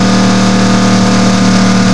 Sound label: mid load; CRC 2005 Demo